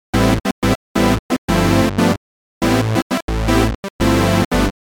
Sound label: OBXa chord; opx pro3